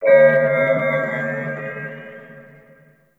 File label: Ship Exterior Satellites; Warframe; Corpus Ship Remaster